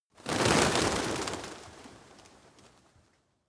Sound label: pigeons flock rise medium; natur tiere; voegel